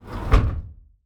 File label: trunk close